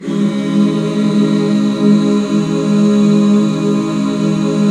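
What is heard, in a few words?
HAL C# minor, Back Chords; Sound & Vision - Gigapack II CD 1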